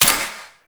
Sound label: Rifle Reload; Warframe; Weapons; Zariman Rifle